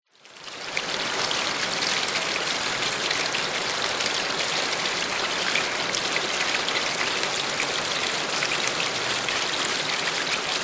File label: nature rain flowing through gutter internal warehouse rain on metal roof above; zapsplat